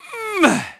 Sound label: EFFORT; VOICE; PHANTOM; Pulse Racer